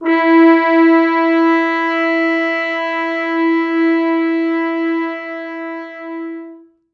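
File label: 4 French Horns soft mutes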